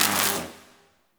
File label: Elec Spark; Ambience; Props; Warframe; Nokko Colony